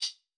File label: Crisp Chuf Foot, Hats; Airwindows